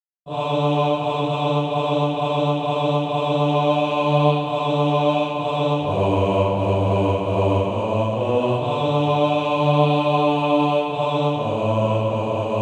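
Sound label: bass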